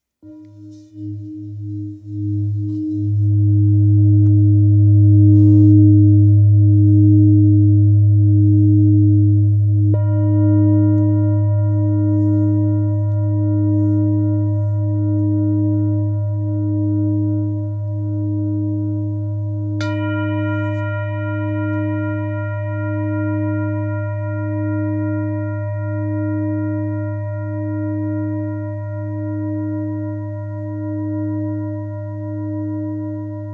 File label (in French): Bol bengale sol diese